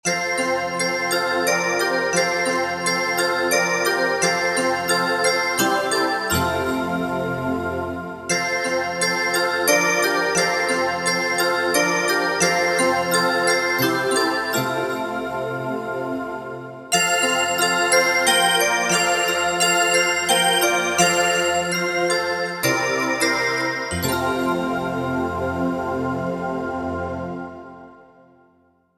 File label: pad